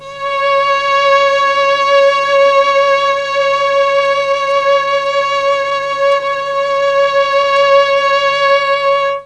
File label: C#5 300 VIOL, Mellotron, STRINGS; Mike Pinder - Mellotron Archive CD